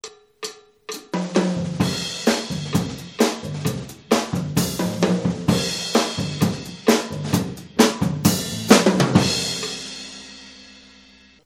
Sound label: ludwig gig; GIG-LITE SET